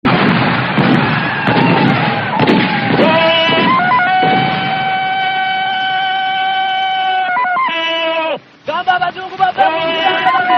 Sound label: TARZAN